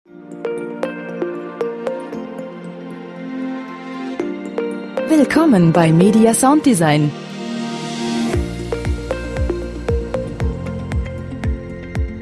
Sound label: pop